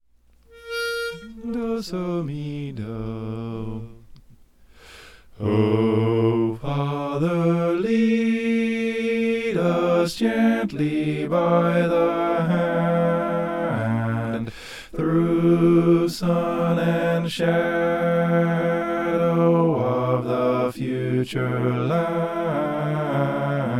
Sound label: bass